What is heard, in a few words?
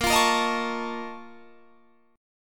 A sharp Minor Major 11th, A sharp, down, Guitar, Standard; strummed